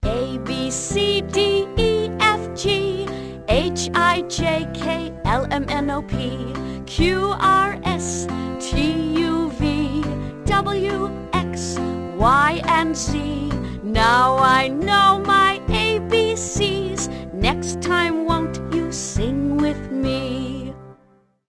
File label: abcdefg; preschool